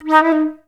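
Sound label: flutes D#3; Best Service; Peter Siedlaczek's Advanced Orchestra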